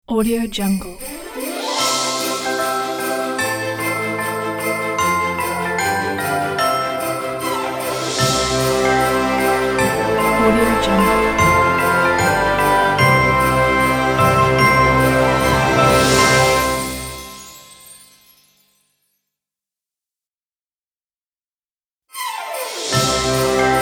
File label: christmas joyful magic fun